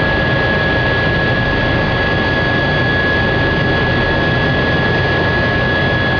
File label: ec135 turbine idle in